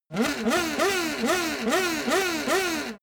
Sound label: racing car f1 rev fast close